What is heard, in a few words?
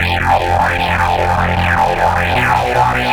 synth, 153 Fmin; Big Fish Audio; Hardcore Breakbeat Action